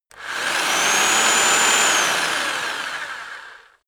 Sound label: rock drill rev reverse very long